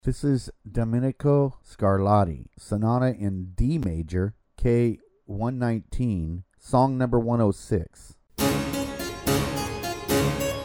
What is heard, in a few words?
Sonata in D Major; Domenico Scarlatti